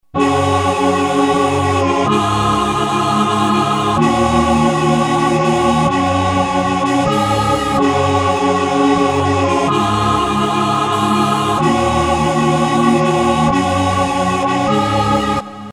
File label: korg m1r synth